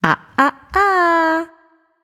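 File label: disagree, female voice; smite; voicepack; hera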